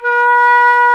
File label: FLT XFLT, Flutes; Club-50 - Foundations Roland